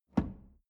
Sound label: drawer medium close lock medium